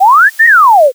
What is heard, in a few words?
whistle